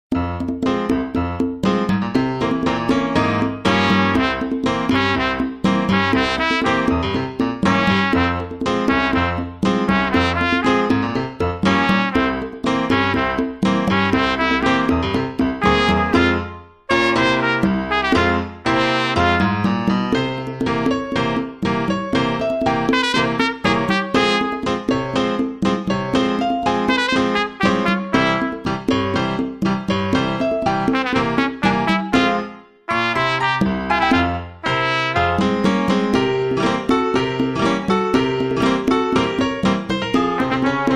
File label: Latino Tpt